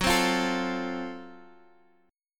F sharp Minor Major 7th Flat 5th, Guitar Half Step Down